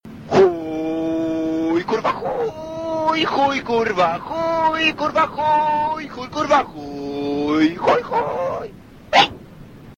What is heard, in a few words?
hejnal